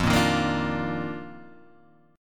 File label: F 13th Suspended 4th F, chord